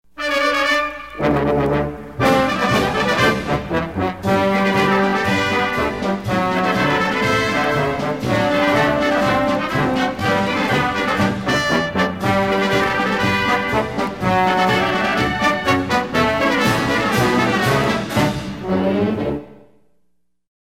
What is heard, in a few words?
basic military parade march